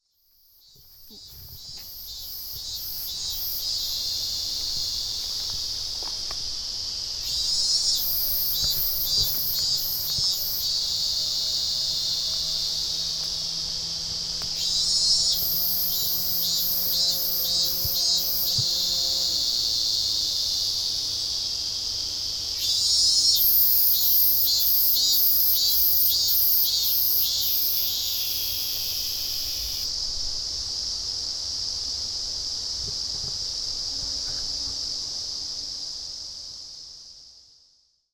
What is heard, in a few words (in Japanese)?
mori no naka kaerimichi semi no koe; Chino Cultural Complex